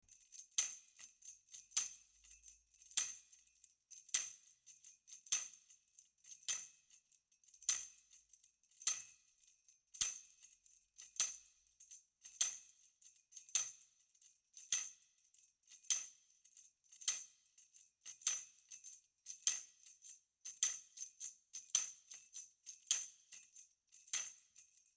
Blues

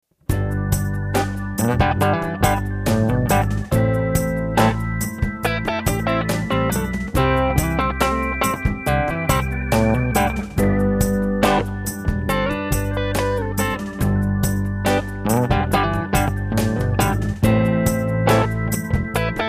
Slow Sixties Songwriting Kit; admiralbob77

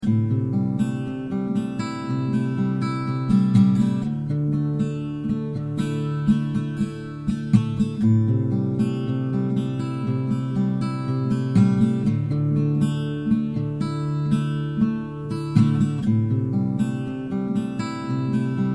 Am 120 Acc GTR Loop

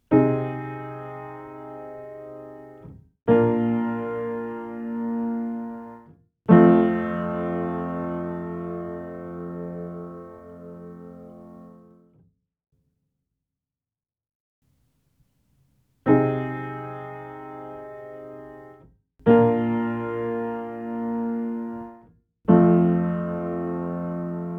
midnight piano samples

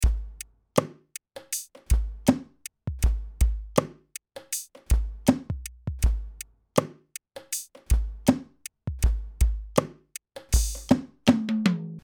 beat

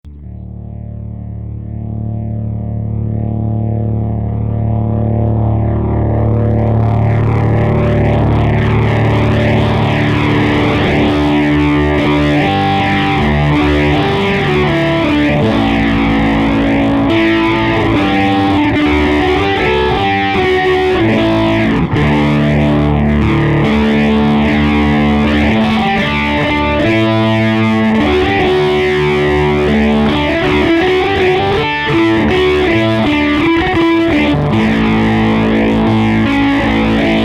Fuzz

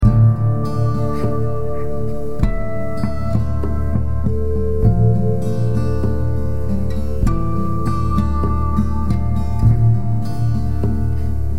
Dm100 GTR based Groove

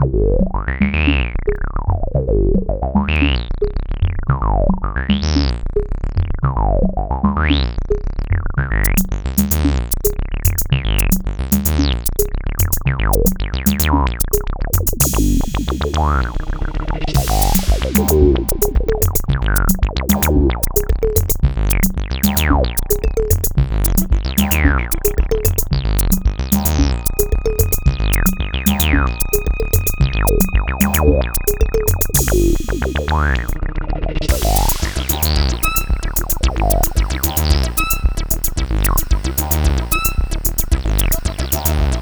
main mix

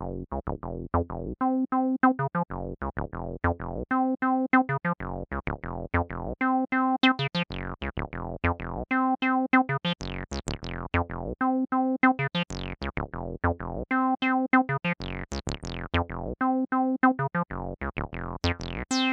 TD-3

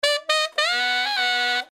brass loop

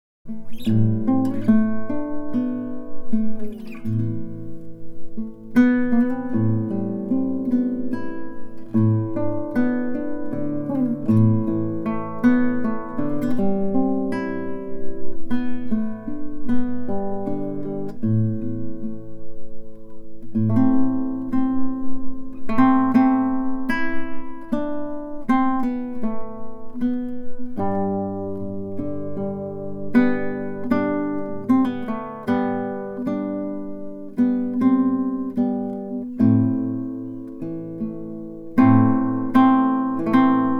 Guitar

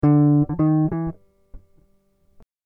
Em 100 GTR Riffs

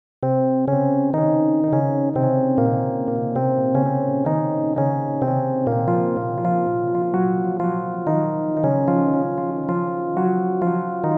Rhodes and Bass Loop

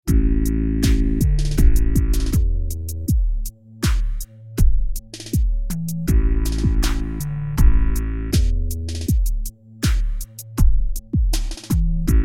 A80 Rock Groove

